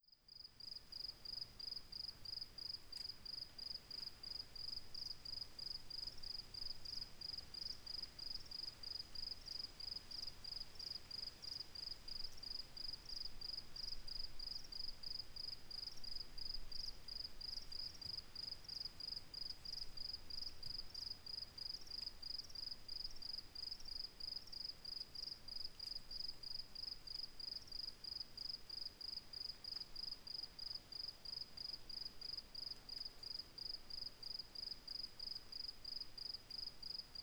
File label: Crickets; eclipsesoundscapes